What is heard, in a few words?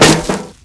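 grass; Mafie 1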